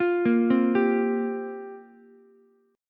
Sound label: F Suspended 2nd Suspended 4th down Ukulele, Fsus2sus4 chord; arpeggiated; JGuitar